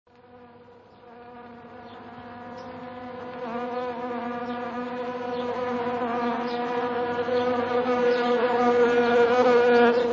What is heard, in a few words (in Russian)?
pchely